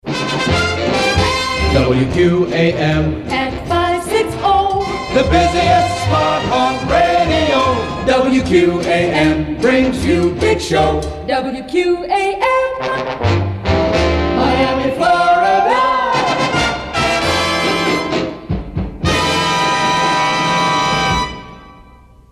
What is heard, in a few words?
Busiest Spot On Radio; WQAM; JingleMill; jingle packages